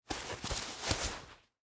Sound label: Winter Gloves Off, Take, Off, Left; Foley, Gloves; Dressing (Sound Effects:Human); BlastWave